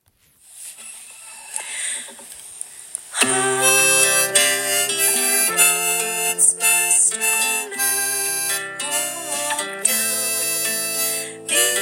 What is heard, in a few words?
Blowing wind harmonica, Mercedes Harmonica; voith usa